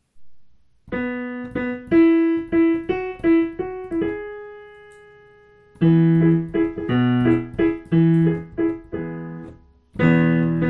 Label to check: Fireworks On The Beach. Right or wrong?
Wrong